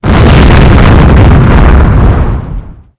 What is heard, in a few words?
flame orb